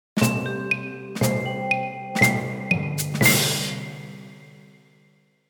perc playful

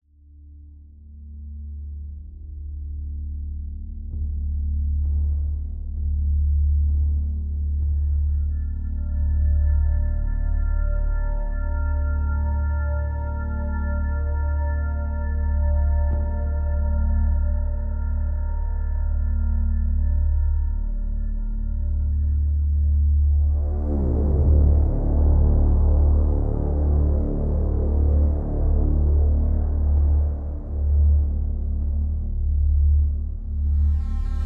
ambient

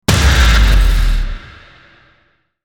hit big

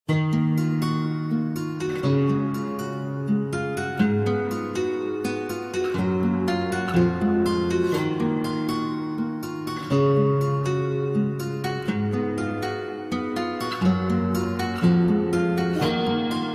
acoustic version

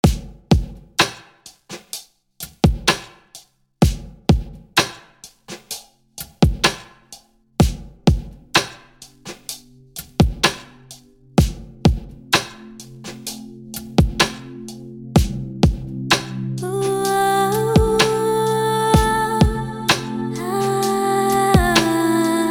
vocal chillout remix

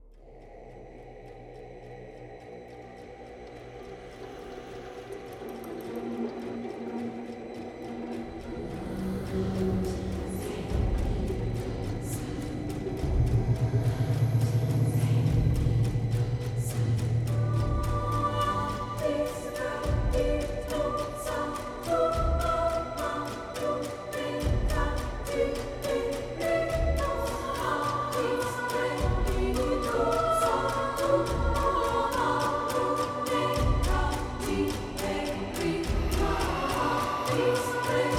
instrumental

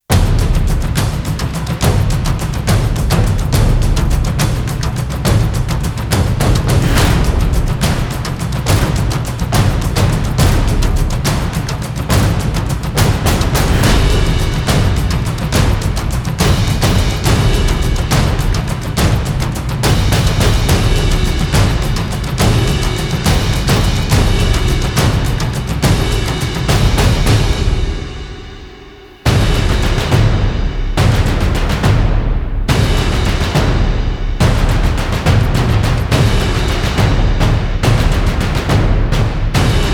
drums

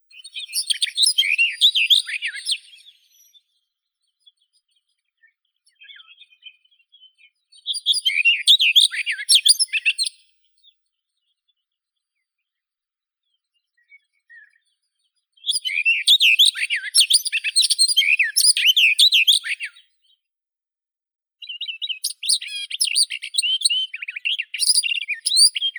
slavka yastrebinaya sylvia nisoria

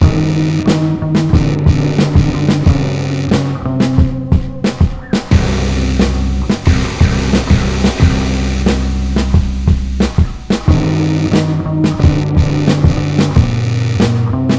instrumental

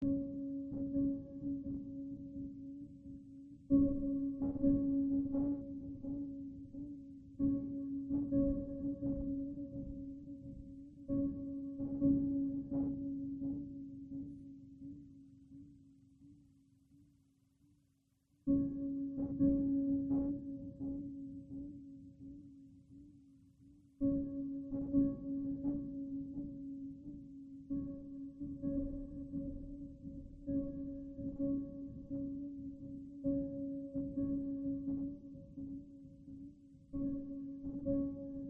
ambient

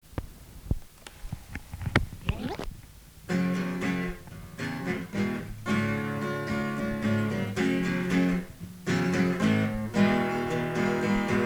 acoustic and vocal

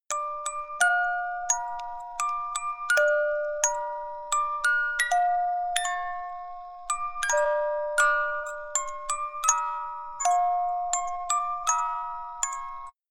music box phrase

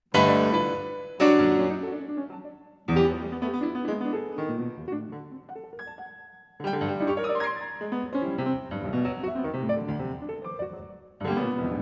variation for piano solo

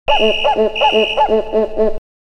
fx and scratches

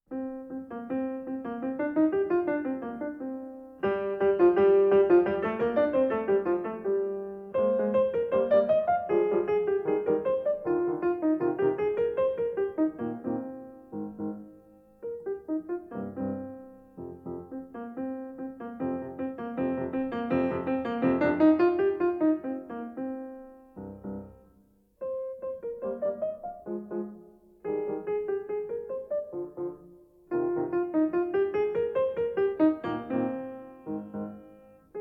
ten easy piano pieces slovak boys dance; bela bartok

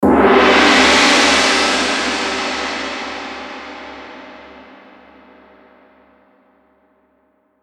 gong

